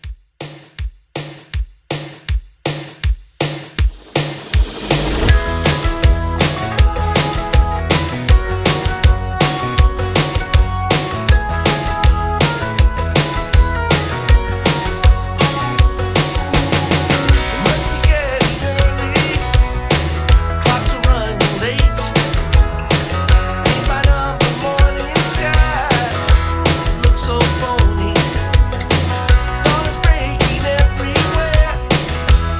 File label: 80s Rock 160